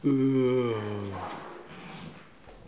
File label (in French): bruit animal